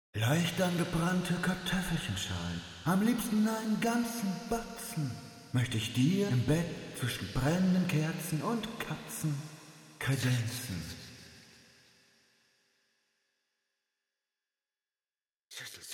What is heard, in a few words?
Eventide SP2016 Room Modern; OpaJott